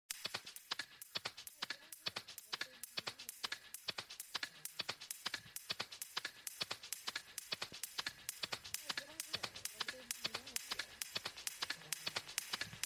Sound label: techno mix